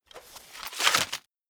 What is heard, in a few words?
dagger 1 hand sheathe